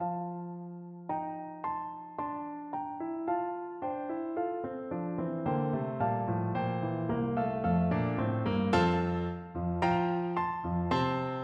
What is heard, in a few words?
ode to joy piano duet